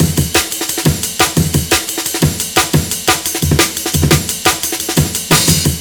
175 bpm, breakcore

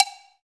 COWBL, ETHNIC PERC; Roland LA

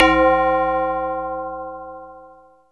tubular bell; SN-R8-07 Mallet

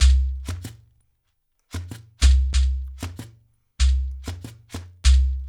LOOP, Percussion, FUNK

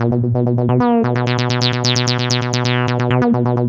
B2, synth line; 90 s Sample CDs; NiceBeats; SynthLine VOL-3

